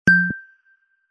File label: ting ting message tone ringtone